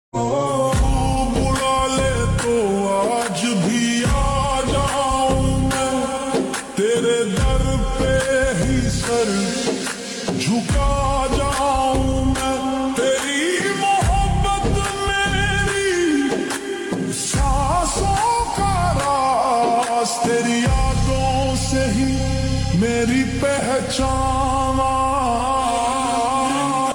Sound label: Slow Reverb Version Ringtone